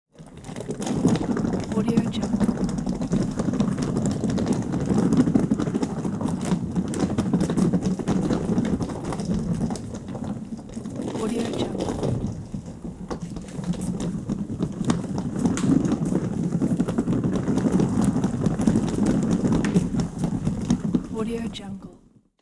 rolling suitcase; urban sounds

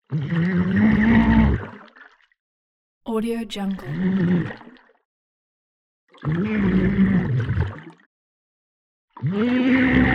water underwater male scream soft

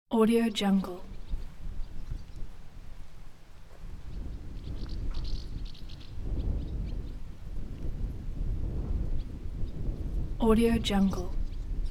martin swallows flying on wind; صدای پرستوها در طبیعت; nature sounds

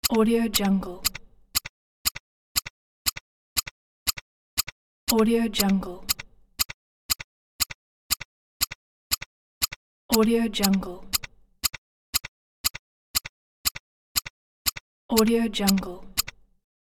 clock ticking slow; domestic sounds

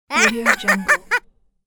nasty kid girl evil cackle; human sounds

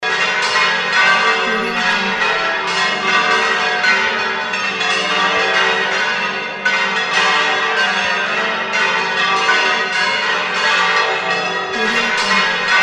cathedral wedding bells; urban sounds

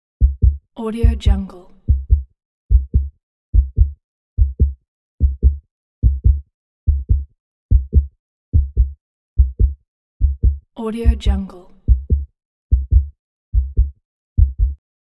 fast heart beat; ضربان قلب تند; human sounds

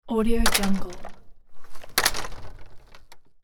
old metal screen door close; domestic sounds

